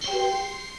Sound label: clock